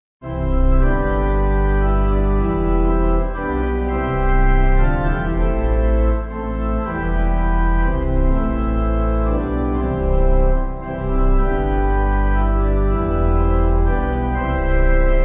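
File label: Pipe Organ; Resignation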